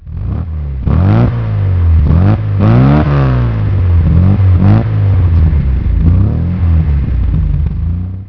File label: mgb works